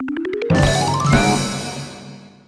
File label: Cartoony; Fanfare; 1997 MMX LAN PACK (win 95); Worms Armageddon